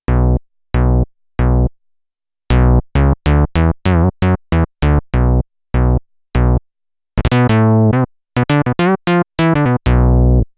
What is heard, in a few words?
Bass